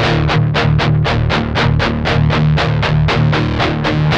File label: 8TH E 2, Guitars; Spectrasonics; Hans Zimmer Guitars VOL-1; 128-JACKHAMR